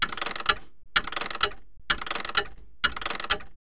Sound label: Clock